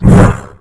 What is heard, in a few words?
sleep pain; sleeper